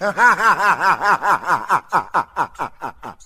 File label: laugh